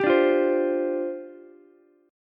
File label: C Suspended 2nd Suspended 4th, down, Ukulele; Csus2sus4 strummed; JGuitar